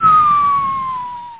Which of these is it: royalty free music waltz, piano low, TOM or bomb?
bomb